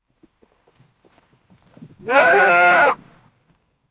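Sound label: strong and energetic horse, farm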